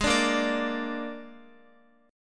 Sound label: Ab Suspended 2nd Flat 5th Ab, Guitar; x,x,8,7,10,10; 4F,4A,5C,5G,5C,6E; strummed; JGuitar